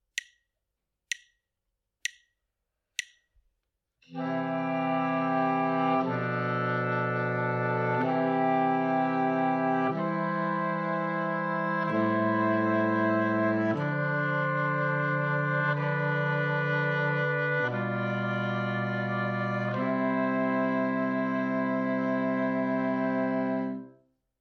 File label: harmonic dictation